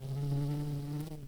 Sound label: Lapidarius queens, bumblebees